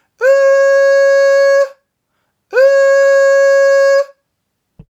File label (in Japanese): 芯ありファルセット